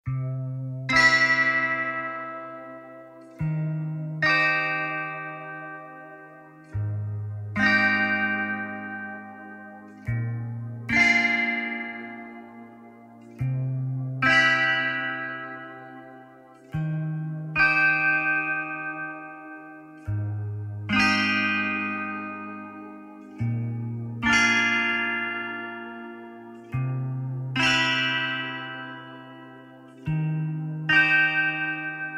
Fresh Rain Loop

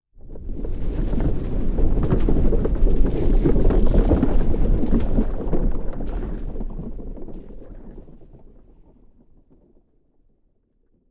Earthquake

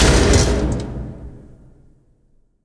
Gate Slam; Grumble

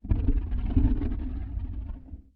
Growl; Dupe; Plays if within close proximity of a duped door.

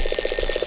Alarm Clock Ringing

